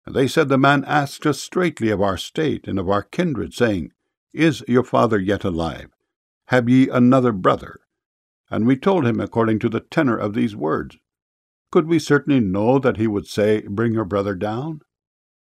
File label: tenor